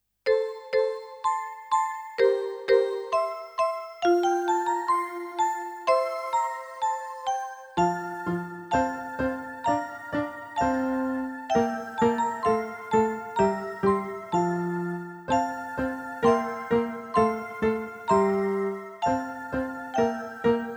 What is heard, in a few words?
Twinkle Twinkle, baba musiek